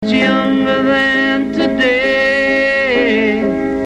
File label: Piano Amaj7